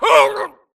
grunt death neck; Titanfall 2

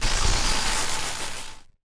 arc titan spark; Titanfall 2

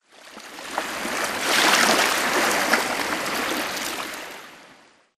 swampland water lapping; Titanfall 2

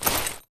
Grunt Mvmt FOL Soft Short; Titanfall 2

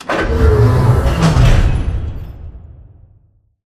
Robot Arm Right Deliver Close; Boomtown; Titanfall 2

